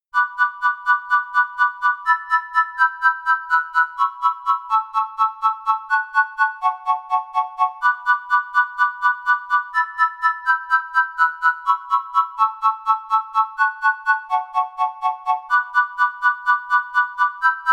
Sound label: chiff keys